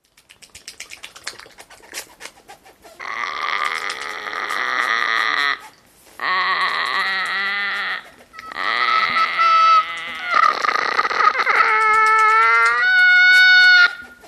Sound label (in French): Oiseaux mix